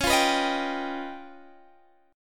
Db 7th Sharp 11th; Db7#11 strummed; JGuitar

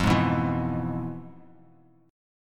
F Minor Major 7th Flat 5th Bass Standard; FmM7b5 strummed; JGuitar

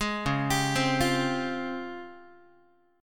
Db Major Sharp 11th, Guitar; arpeggiated

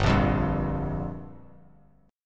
G Minor 7th; Gm7 strummed; JGuitar

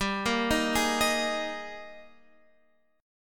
G sharp Major 7th Suspended 2nd

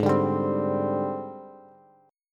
Ab Major 7th Flat 5th Ab, down, Bass; strummed; JGuitar

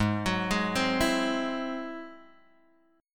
Ab Augmented 7th Ab down Guitar Open G

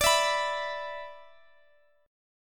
B-Minor Double Flat 5th

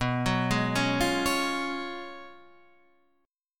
F sharp Major 7th Suspended 2nd Suspended 4th, B

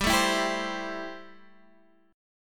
A-Minor 7th G

